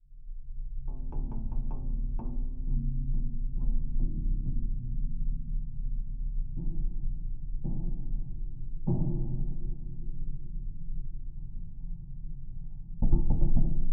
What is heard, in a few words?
Geofon, Metal Grid, Vibrations; underground sounds